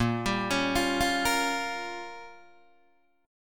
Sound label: A sharp Minor 6th A sharp down Guitar DADGAD, chord